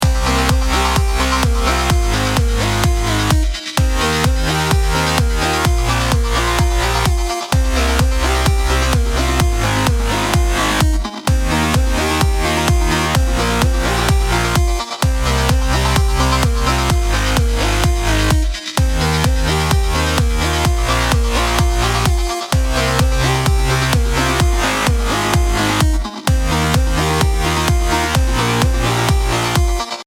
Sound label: EDM WET